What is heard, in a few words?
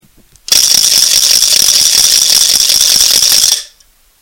wooden rattle